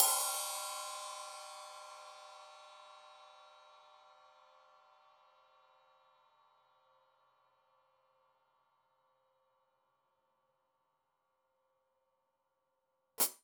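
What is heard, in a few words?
Sab Open Hat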